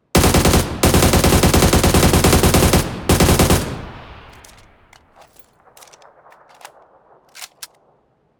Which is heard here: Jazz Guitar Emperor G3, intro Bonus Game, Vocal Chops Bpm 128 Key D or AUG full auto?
AUG full auto